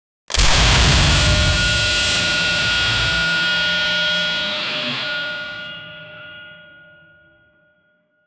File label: heavy arcing electrical, machinery